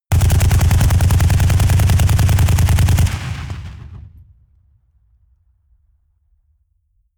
rifle ak-47 exterior fire automatic bass; schusswaffen

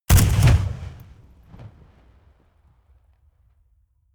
rifle ak-47 exterior fire single huge; comedy-cartoon; Pistols and guns; pistolen-gewehre

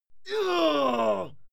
male fight scream pain muted; soundpool

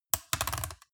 metal device rattle click; industrie-wirtschaft

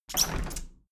window handle plastic squeak open heavy; objekte; fenster

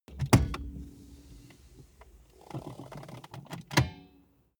car bmw e46 interior seat push back

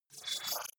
wobble hover swell short

